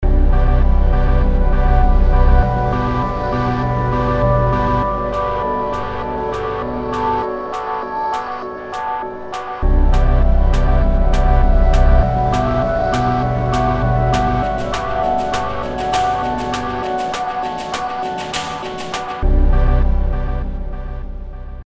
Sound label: electro